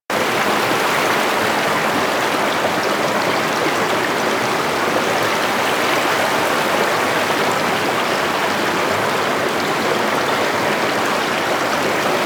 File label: Water River Loop; Warframe; Duviri